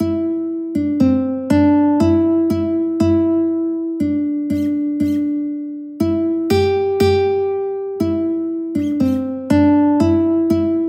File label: guitar; mary had a little lamb